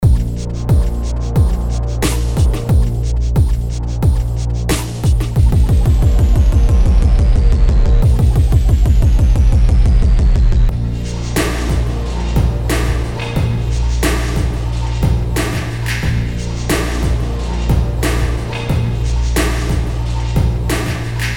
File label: BASS